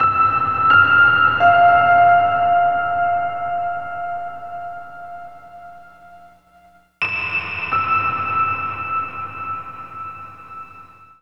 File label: PNO; Best Service ProSamples vol.03 - Drum & Bass 1; AKAI